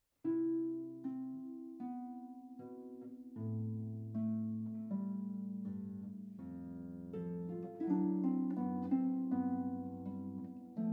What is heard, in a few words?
Harpist; London